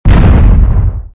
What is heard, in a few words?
explosion